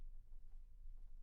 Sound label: Bass Room